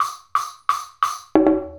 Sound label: Wood Block and Djembe